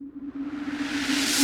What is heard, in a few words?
Fx Hit